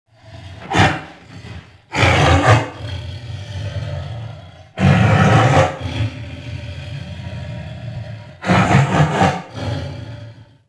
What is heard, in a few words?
tiger growl